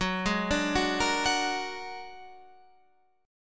A sharp Augmented 7th F sharp, guitar

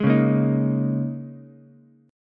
A sharp Minor 11th A sharp down Ukulele C6, chord; strummed; JGuitar

